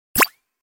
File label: click